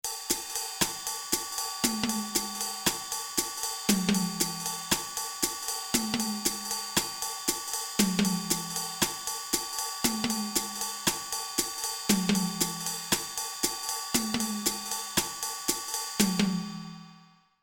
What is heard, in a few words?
Jazz